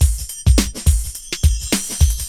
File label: BEAT, Beats 102-105bpm, Hip Hop; E-MU Formula 4000 Series Vol. 1; Hip Hop Nation